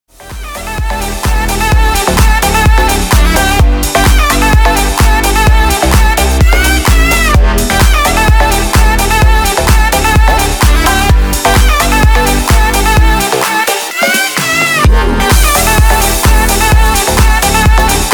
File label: broken drum